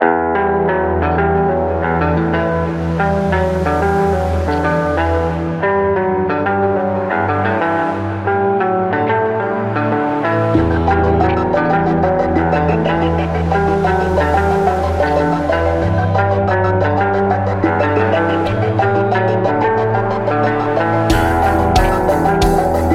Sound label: Business Rock, corporate music; corporate music vol 1